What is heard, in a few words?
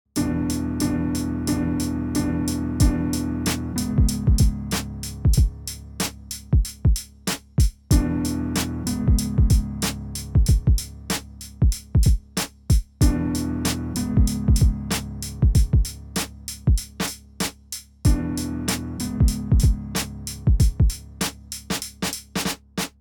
Fingerdrumming